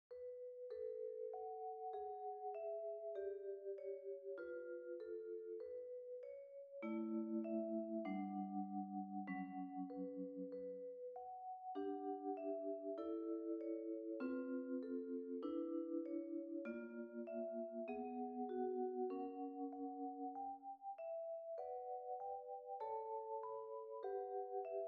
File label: vibraphone; God rest you merry gentlemen